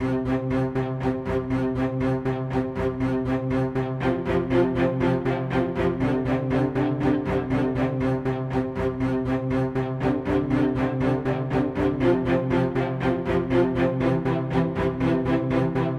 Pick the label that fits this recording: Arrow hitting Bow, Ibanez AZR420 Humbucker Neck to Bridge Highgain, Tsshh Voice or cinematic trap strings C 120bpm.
cinematic trap strings C 120bpm